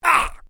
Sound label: MALE VOCALIZATION ARGH; Male vocal sounds; Exclamations; Voice